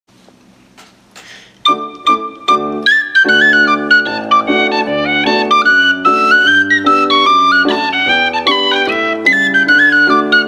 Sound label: belfast hornpipe